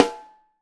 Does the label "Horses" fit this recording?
No